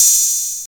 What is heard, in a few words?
Short Reverb Open Hat F# Key, Royality free open hat sample tuned to the F# note